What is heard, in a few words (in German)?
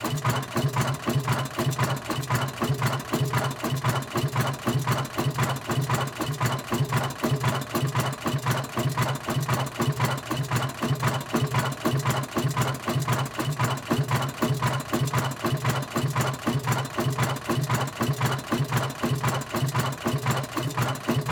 Dieselmotor 1905; MAN - Augsburg; Shotgun Mic; 24-Bit 192 kHz